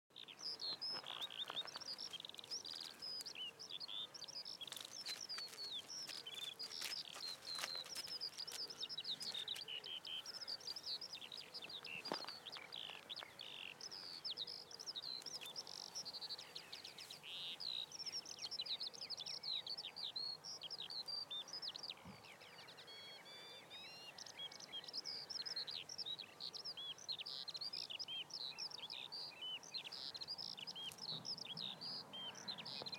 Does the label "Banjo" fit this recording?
No